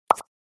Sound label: Cartoon idea pop